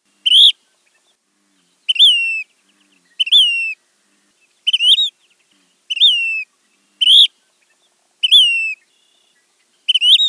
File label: Says phoebe